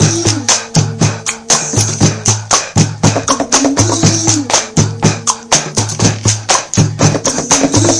salt in the sun percussion, folksy; REMIXABLE COLLECTIONS; Folksy Guitar and Voice Stems

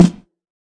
thud snare; Hip Hop; Hip Hop Specialty Kit; style kits

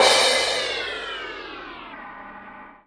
crash gliss down, cymbals; DRUMS (SINGLE HITS)

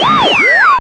short shortwave; Weird and Interesting Hits

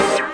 minor synth stab hit; Punches Hits Discoblasts

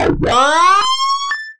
freaky guitar burst bend

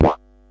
silly elec fx; drums full kits; unusual kits and fx; Silly Electro FX Kit

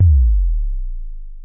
low bass long, Roland SH09, drum machines

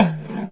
GATE TOM, Gated Reverse Slapback; drums full kits; style kits

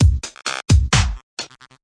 serious mood, synth and electronic loops

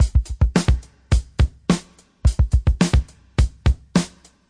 106 bpm, manykicks, drum loops and breaks

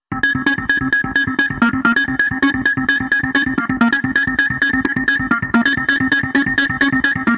dirty arp, synth and electronic loops

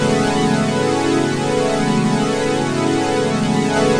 mks pad; Pads

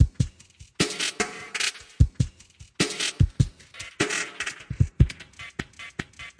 150 bpm glitch my machine; 141 to 160 bpm

